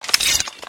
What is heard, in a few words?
bowie draw, weapons, knife; csgoknife